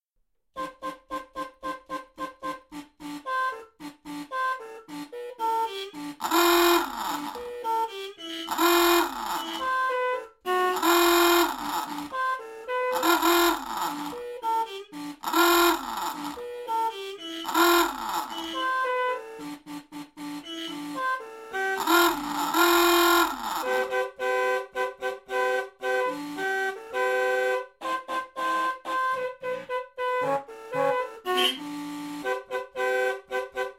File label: jingle bells